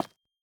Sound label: place, calcite; minecraft 21w07a; block